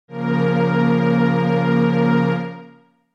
Bb Bb Major

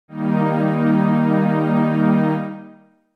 Eb Eb Major

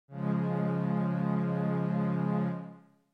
Db Minor

